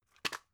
drug package drop; arzt

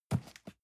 cardboard box medium grab; objekte

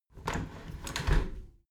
window handle squeak turn close regular; objekte; fenster

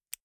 insert xlr extension latch soft; objekte; elektronik

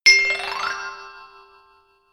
notification glockenspiel low slide up hard

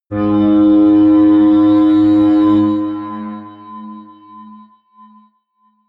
atmospheric feedback synthesizer low, drones

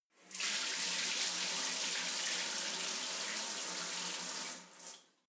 shower on, badezimmer; haus garten

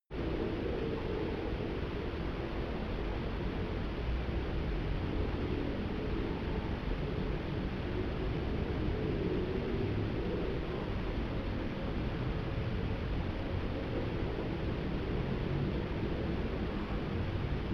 soft noise, Horror; atmosphaeren umwelt

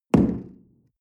coffee place tablecloth medium, restaurant cafe

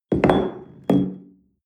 espresso upset tablecloth hard

